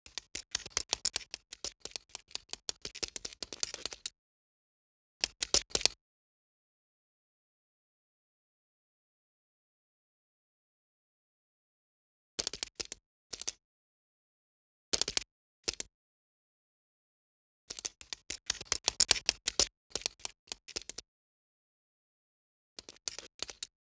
Single Bug Insect; Fairlight Sound Lib

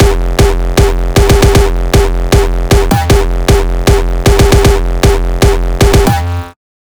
kicks loop 155; GhostHack

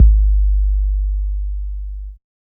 Bass, Dubstep 808 Basses; GhostHack

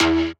Techno Synth Shot E; Synth One-Shots; Ghosthack; 45 Free Techno Synth Shots 2025

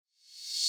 Reverse Crash; Cymatics; Orchid Sample Pack

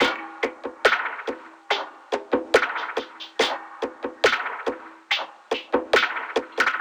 Grand Perc Loop - 141 BPM; Cymatics; Fiji Hihat Loops & Percussion

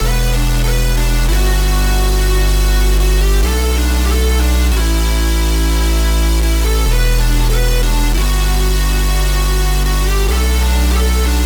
140 BPM B Min, Hyperpop; Magnum Opus

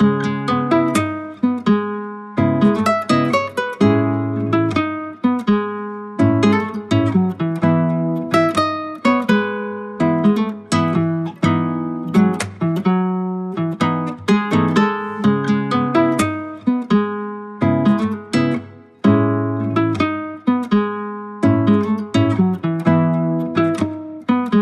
126 BPM C# Min, Guitar Loops; Cymatics; Journey Guitar Loops

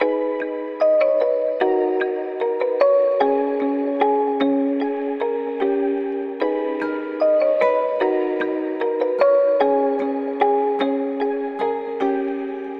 Melody Loop 150 BPM G# Min, Hip Hop; Melodics; Melody Loops; Cymatics; Cobra Hip Hop Sample Pack

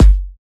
Kick - G, Hip Hop; Drums - One Shots; Cymatics - 9God Hip Hop Sample Pack

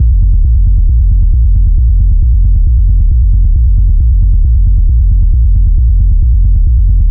Bass Loop A 135, Techno; Ghosthack; AC2024 - Day 1 - Techno Sounds 2025

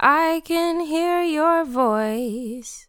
I can hear your voice, Vocals Dry

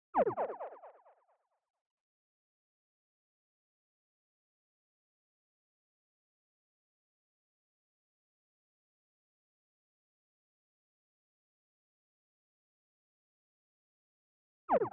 132 BPM F# Min FX, UK Garage; Cymatics - GHOST (Beta Pack)

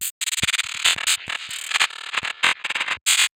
Rain Grid Loop F 142 BPM, Psytrance; Grid Loops

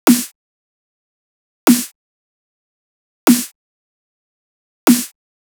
Dubstep Drum Loop Snare, 150bpm; Full Drum Loops (Stem Seperated); Ghosthack; Dubstep Sound Arsenal

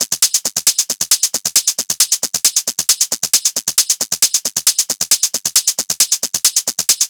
Hat Loop 135, Techno

